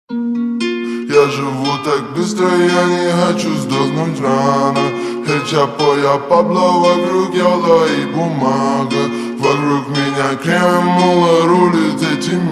slowed reverb

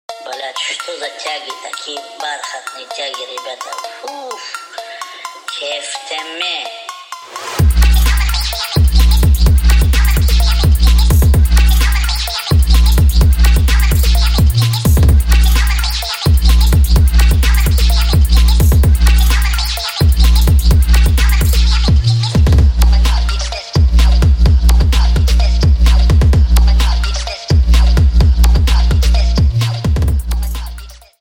phonk speed up reverb

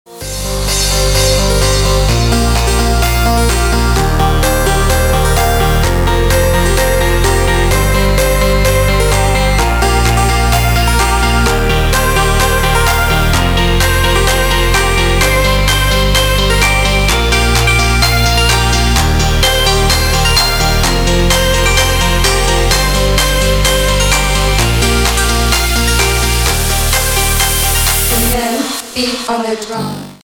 beat my drum

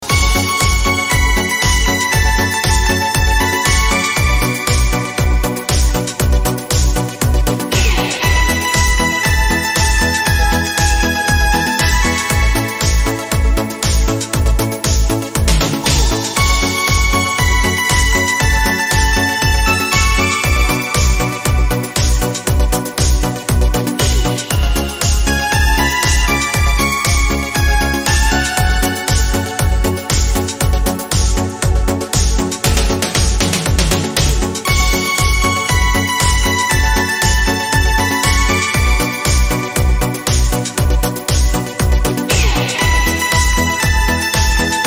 euro disco atmospheric melody

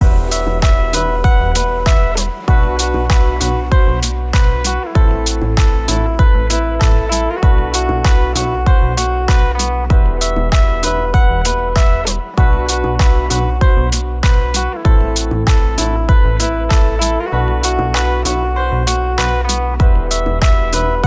ringtone music

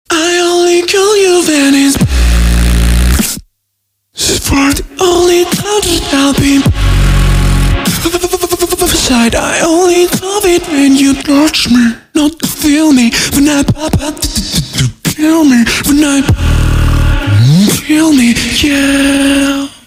beatbox cover

